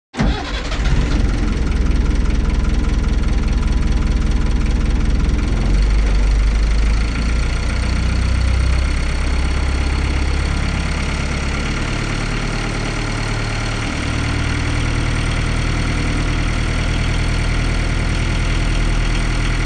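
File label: Boat Engine Start run, powerboat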